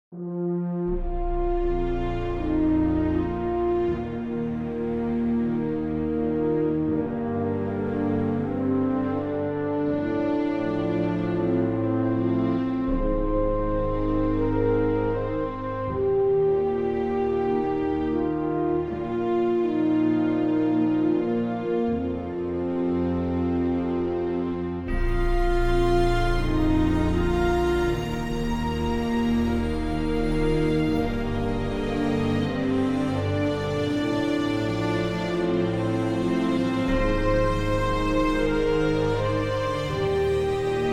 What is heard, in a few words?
horns soft